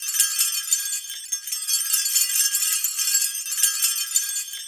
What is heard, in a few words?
TANZNIABEL, TANZ.BELL; Spectrasonics; Heart of Africa 1